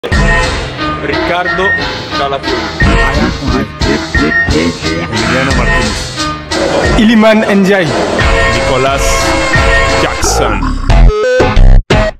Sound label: funk slowed